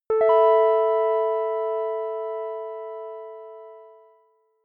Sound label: announcement keys minor